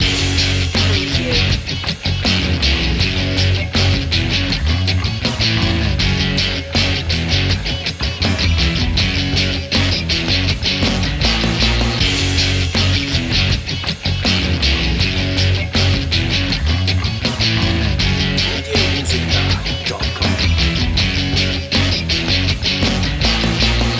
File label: rock life loop